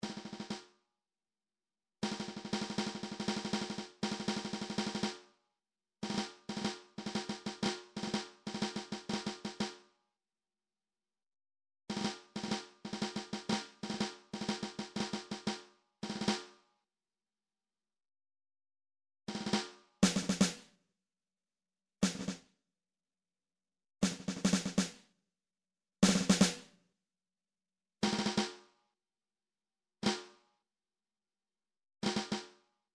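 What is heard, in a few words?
midi drums percussion snare rolls; midi vol 4 drums percussion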